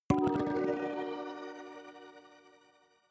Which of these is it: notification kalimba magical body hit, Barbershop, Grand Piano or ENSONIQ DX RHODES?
notification kalimba magical body hit